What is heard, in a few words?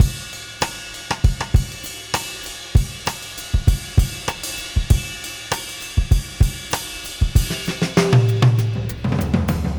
Beat Loops; Zero-G; Monster Beats